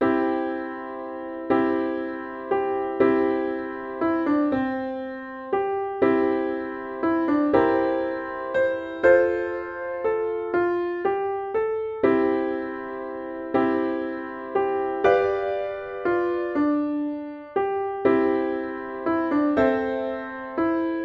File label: Melody